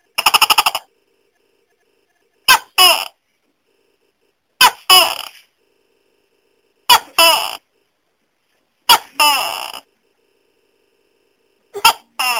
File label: Tokek Sound Ringtone